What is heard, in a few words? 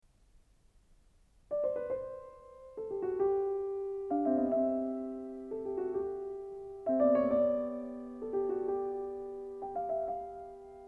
33 Piano Variations in C; Anton Diabelli